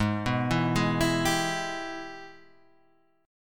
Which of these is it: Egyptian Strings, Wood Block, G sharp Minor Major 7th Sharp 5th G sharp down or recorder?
G sharp Minor Major 7th Sharp 5th G sharp down